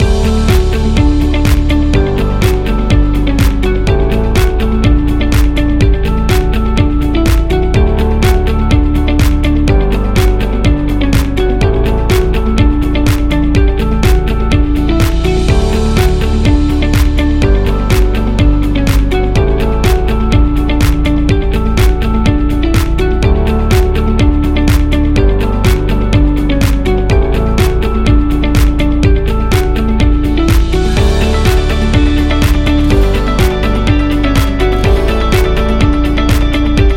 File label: Inspiring Loop